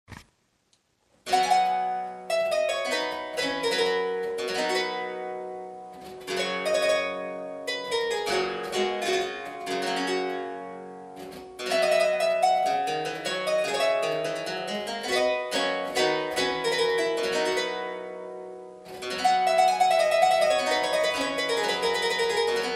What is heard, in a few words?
harpsichord